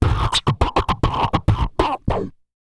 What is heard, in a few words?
DRUMS, MALE PERC; Spectrasonics Vocal Planet; Jazz FX